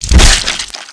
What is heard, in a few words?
death